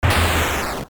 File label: Staircase; openbound